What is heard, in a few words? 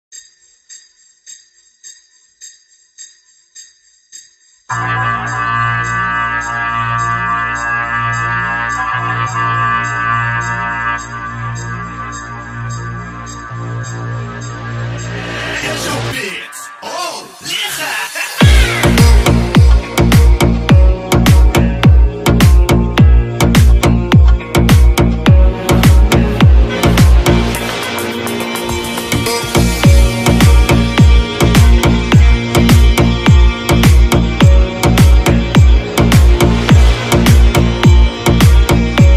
club mix arabic remix